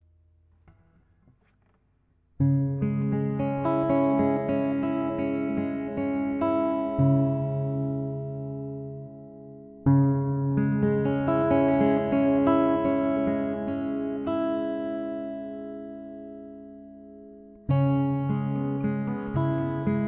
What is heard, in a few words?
lowden f 35c